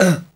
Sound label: ERRRR; AMG - Vince Clarke Lucky Bastard